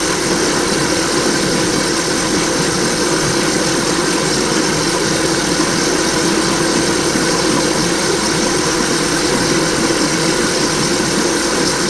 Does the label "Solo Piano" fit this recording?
No